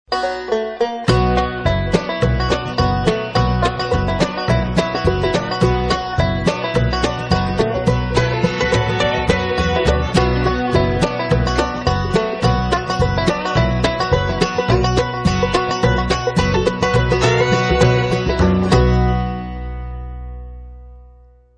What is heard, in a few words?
bluegrass break down